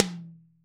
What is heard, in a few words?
tom, Roland MC-909